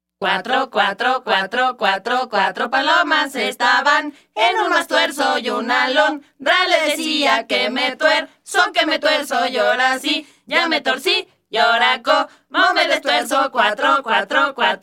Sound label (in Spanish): Jejeje